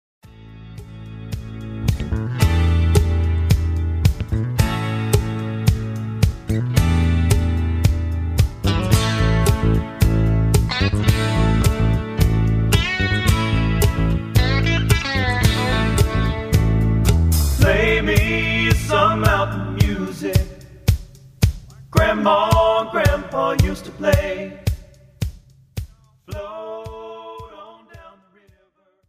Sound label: Mountain Music